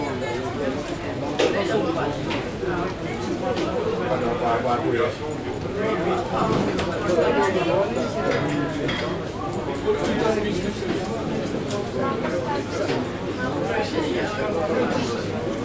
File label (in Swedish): bakgrundsloop